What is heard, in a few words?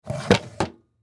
Drawer Night Stand, Drawer Night Stand Wood Close Hard; Furniture; Drawers; Household; Blastwave